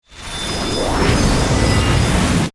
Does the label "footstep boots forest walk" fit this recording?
No